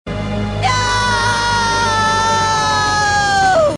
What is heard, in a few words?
nooooooooooooooo; larry